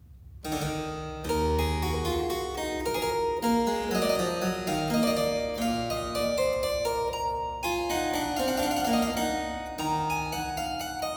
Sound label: Zweistimmige Inventionen in E minor, Keyboard Works; BWV 778; J.S. Bach; Inventionen & Sinfonias